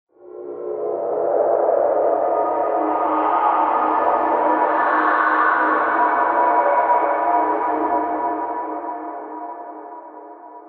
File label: Synthetic Swell